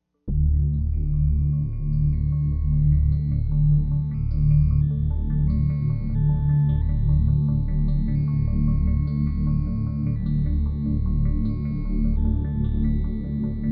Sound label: Low Pad D; Deal or No Deal; Wii